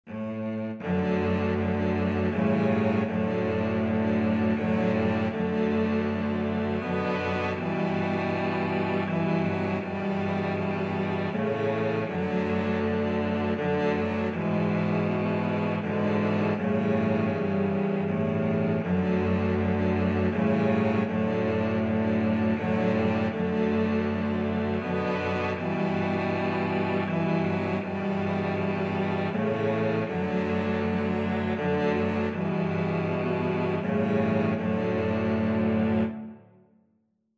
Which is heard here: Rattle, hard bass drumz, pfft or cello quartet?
cello quartet